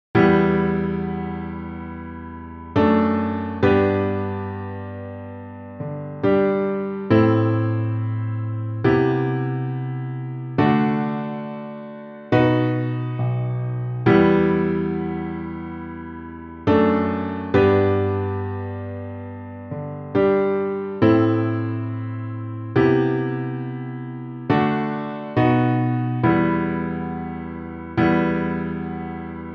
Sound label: piano